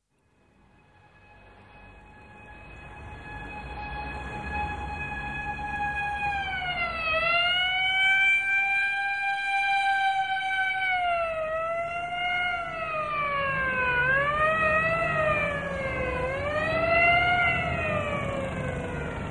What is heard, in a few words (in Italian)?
SIRENA